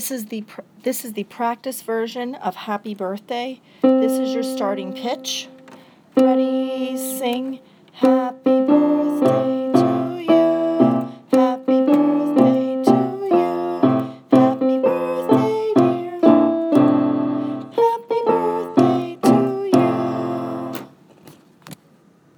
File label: practice happy birthday